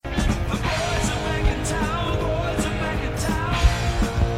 cowboy song

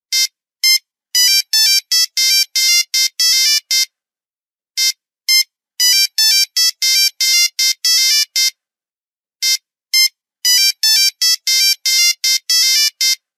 Nokia 3310 old classic phone

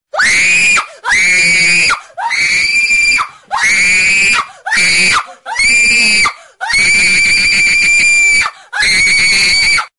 Girls screaming sound

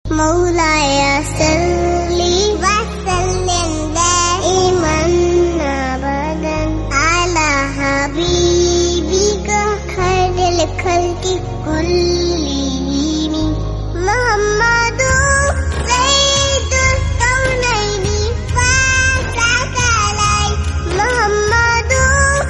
Cute Girl Voice Islamic Song

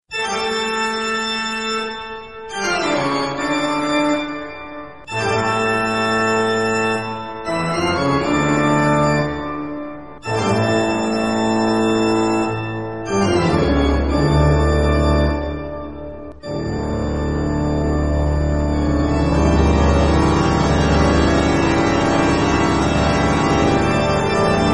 toccata and fugue in d minor; Bach

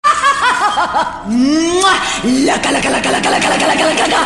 Laugh; Chandramukhi movie

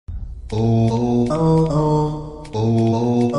computer Music; Koi mil gaya

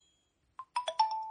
notification sound; instagram

